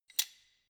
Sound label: wrench open soft; schrauben drehen hydraulik